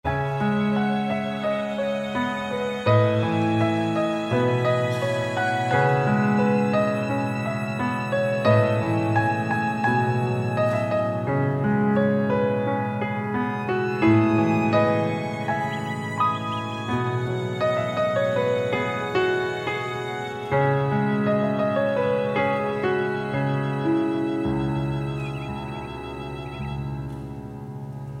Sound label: musicbox